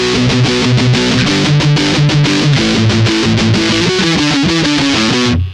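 Les Paul Mesa neck, Neck pickup, Sansamp в режиме Mesa/Boogie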